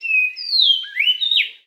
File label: bird, ambient animal; Counter Strike Model Pack; CS:GO